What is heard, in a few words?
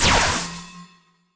light bolt